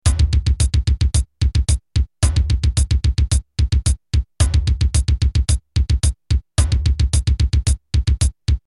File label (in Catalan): pulsacio ritmica